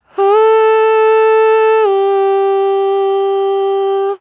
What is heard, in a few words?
riff Long Ooo Dm, Dry Vocals; Raw Vocals; Slate Digital Omnivox Vocal Sample Pack